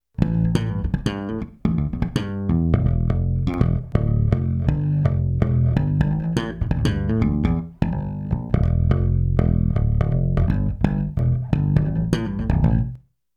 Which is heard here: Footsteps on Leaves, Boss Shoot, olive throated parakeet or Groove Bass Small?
Groove Bass Small